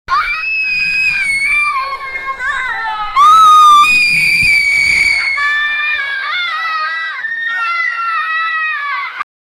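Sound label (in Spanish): niños de buenos aires argentina, anger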